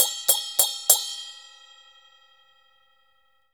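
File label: CHINA SM16 R, China cymbal; Spectrasonics - Heart of Asia CD2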